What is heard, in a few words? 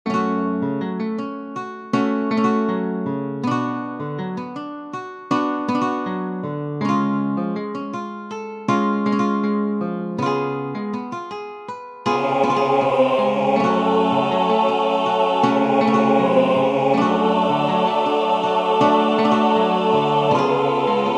hebrew song for choir guitar; menucha wesimcha